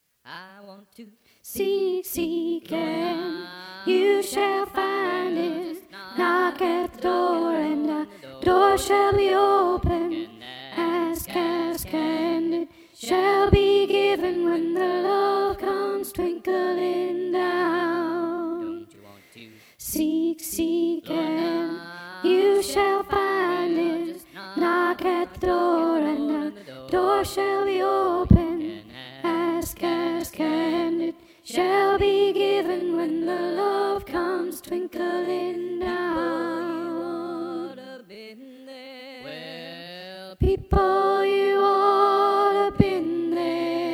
Love Comes Twinklin soprano

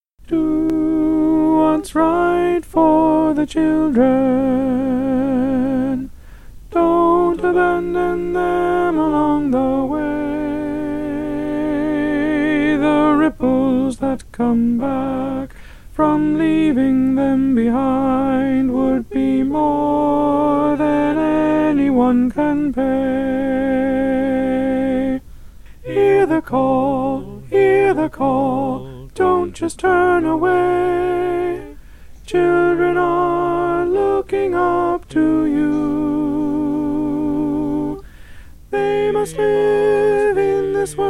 alto

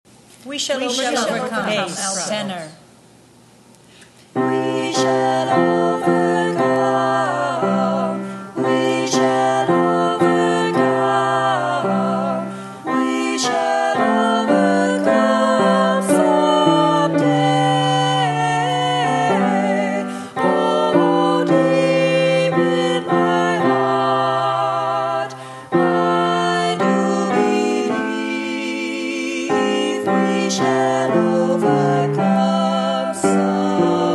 We Shall Overcome sung

